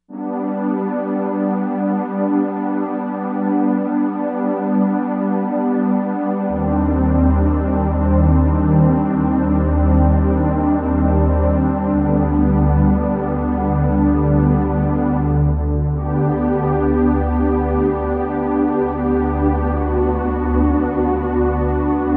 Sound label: ORBit Pad